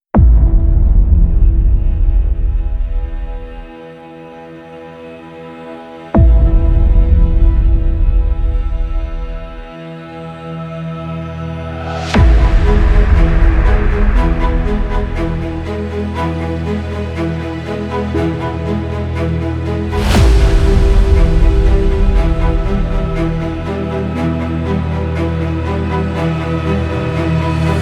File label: Trailer Action Cinematic